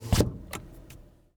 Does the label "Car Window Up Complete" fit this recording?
Yes